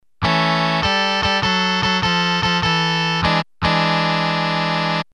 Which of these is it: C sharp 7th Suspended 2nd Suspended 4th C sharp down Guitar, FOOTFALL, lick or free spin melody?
lick